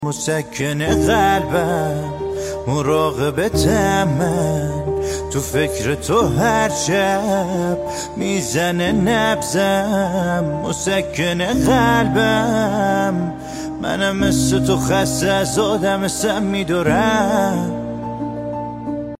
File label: Piano Version